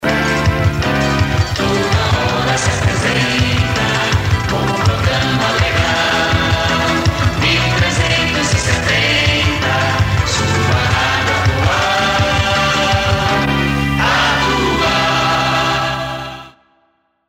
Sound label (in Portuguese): Radio Atual SP vinheta